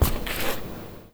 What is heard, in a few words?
slide